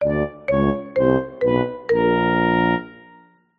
cartoon fail negative descending musical tuba marimba oboe